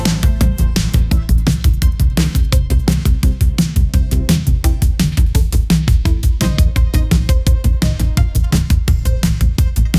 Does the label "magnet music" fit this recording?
Yes